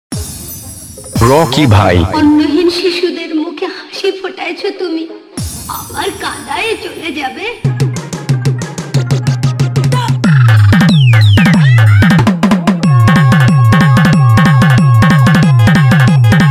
Tending Raning Humbing Bass Ambulance Hron Animal Sound